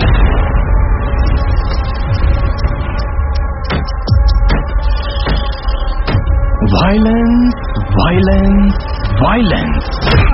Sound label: Bgm Ringtone; KGF 2